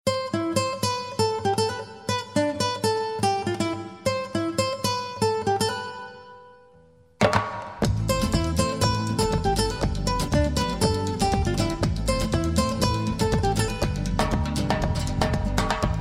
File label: classic guitar